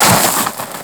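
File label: body fall; WhiteOut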